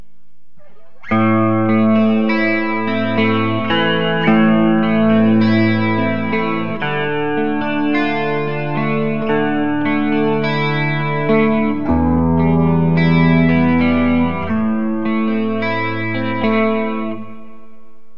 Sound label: neck clean; Burny